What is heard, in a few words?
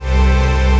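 chord pad, Pads; Best Service; XX Large - Pads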